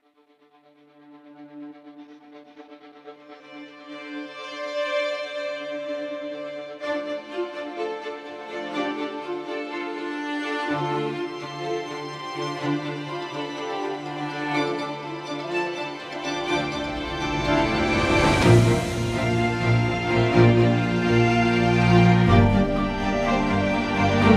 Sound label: Rhythmic Textures; Damage